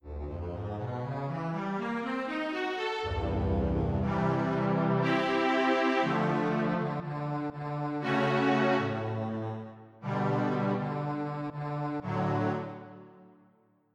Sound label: Synth Strings